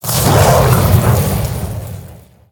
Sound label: Molten Rock Play